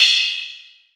FX crash, break beats

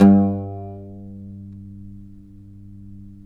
OUD G; Roland - LA Composer vol. 3

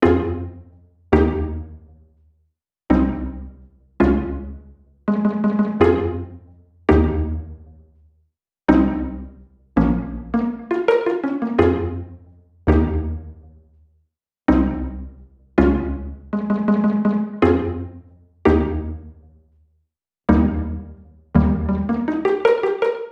pizzicato A, Smooth RnB, Fmin

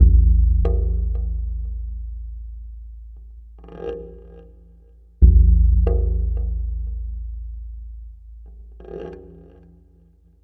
Tribal Wave Drum; Sonic Foundry (Sony Creative Software); Ma Ja Le Ethereal Textures; Worldly Rhythms

